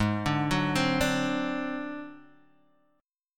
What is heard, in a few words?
Ab 7th Flat 5th Ab down Guitar Standard, Ab7b5 chord; Ab7b5 arpeggiated; JGuitar